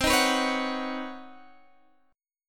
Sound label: B Major Add 9th C, chord; Badd9/C; strummed; JGuitar